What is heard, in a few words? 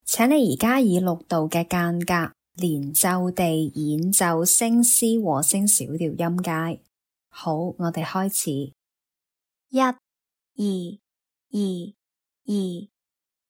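C sharp minor harmonic scale 6th apart legato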